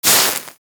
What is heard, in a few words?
Axe Projectile Impact A; Tenno; Sundial